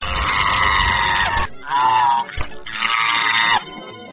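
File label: monkey scream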